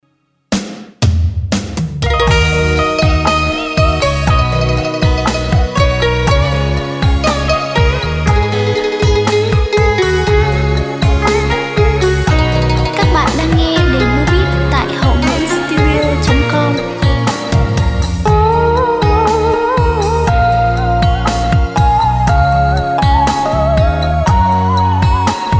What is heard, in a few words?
beat, playback